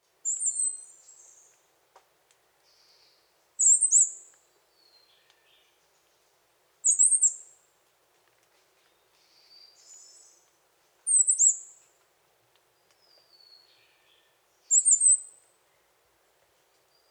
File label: Song, call, Birds of the Costa Rican Cloud Forest